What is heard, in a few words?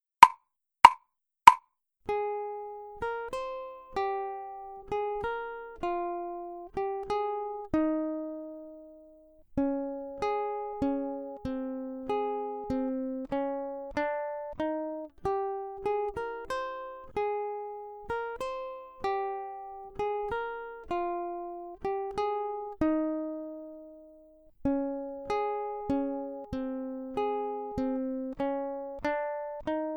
Accompaniment, ukulele; grade7